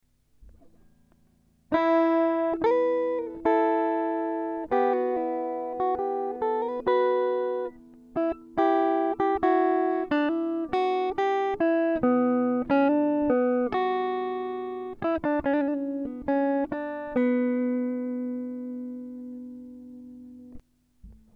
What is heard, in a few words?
Em 140 renaissance guitar impro